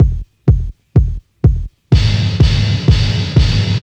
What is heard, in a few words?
industrial strength, Deep House 125bpm